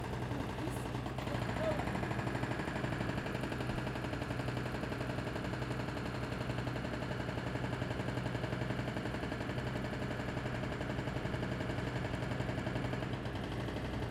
Construction City

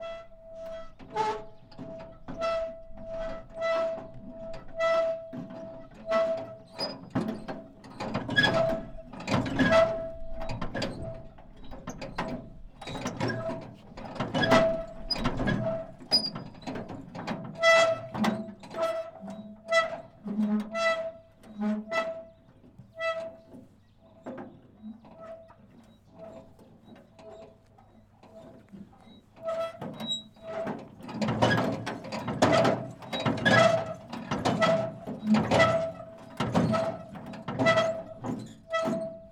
See Saw

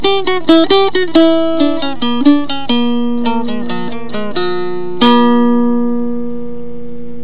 Bouncin Blues

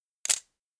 Nikon FM2n

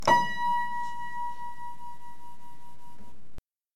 Piano

